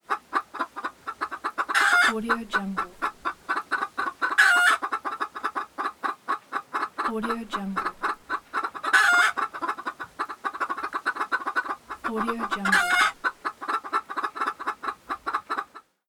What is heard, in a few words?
chickens; soundjay